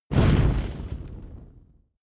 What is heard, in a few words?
torch medium inflame